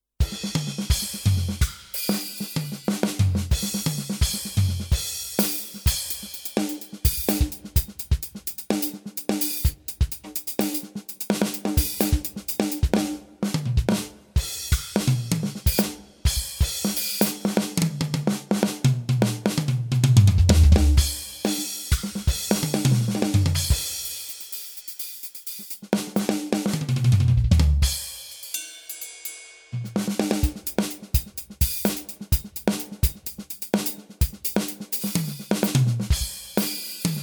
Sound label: drums